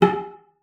CELLO FINGER; 90 s Sample CDs; Xsample VOL-5

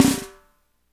SNR; Spectrasonics; Burning Grooves

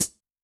Pedal, HiHat; Yamaha DTXpress-II

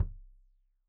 Kik Stereo; Deeply Sampled Drums; SMDrum

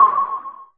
noise fx; Masterbits; Switch FX

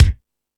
Lazer Drums, drum machine

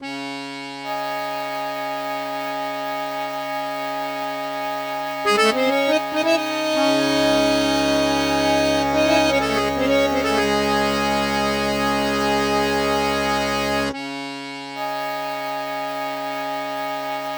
Flute Accordion Combo, Cinematic Funk Urban; Sonic Foundry (Sony Creative Software); Cinematic Funk Urban Tone Poems

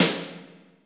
REV SNARE; Greytsounds; Scott Peers Sound Engineering

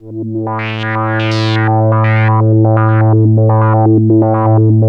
ARP 2600, Vintage Keyboards; Big Fish Audio; First Call Vintage Keyboards

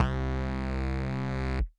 Bass C1, Old Skool House, 132bpm; Big Fish Audio; Marshmellow; Construction Kits

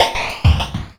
Wah Bumpers; Sonic Foundry (Sony Creative Software); Esoterik Beatz; Wah Fx

